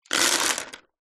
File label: office pencils x30 set down on wooden table